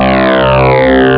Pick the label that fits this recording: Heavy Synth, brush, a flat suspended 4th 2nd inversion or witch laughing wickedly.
Heavy Synth